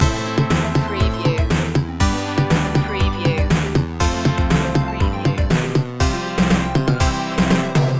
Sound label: silly gameboy loop